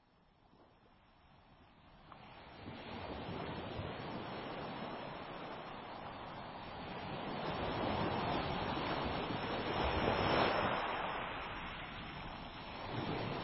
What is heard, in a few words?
Sleepy Ocean; relax